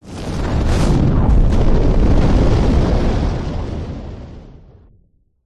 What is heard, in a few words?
explosion fire burst backdraft; zapsplat